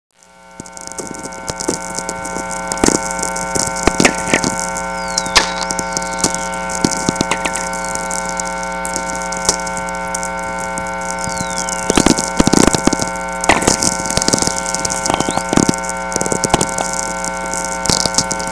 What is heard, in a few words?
strange